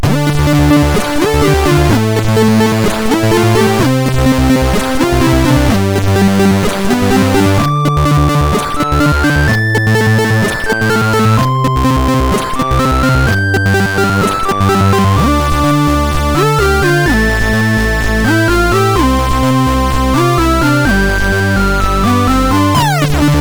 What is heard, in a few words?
MOS6581; sid; MOS6581R2